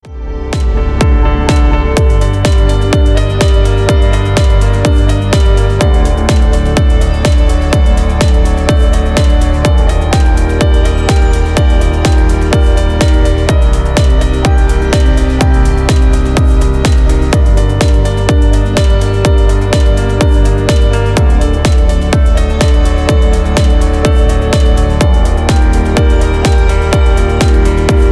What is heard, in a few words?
piano cover